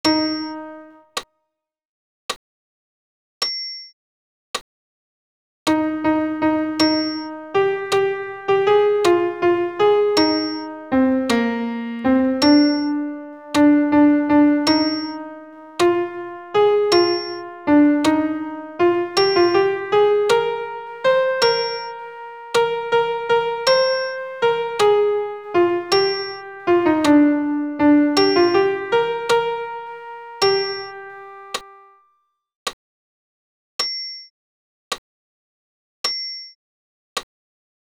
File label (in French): Credo alt1 pian seul